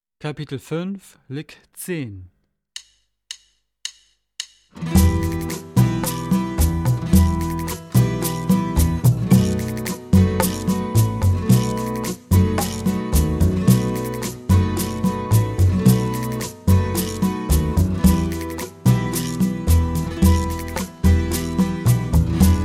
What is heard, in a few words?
Latin Style Guitar